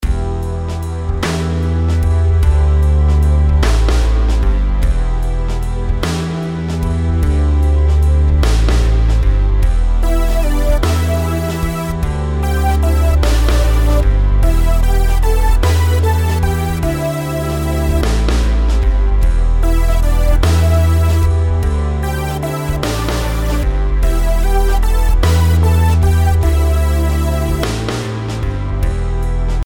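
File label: melodies compound 8s E minor; melody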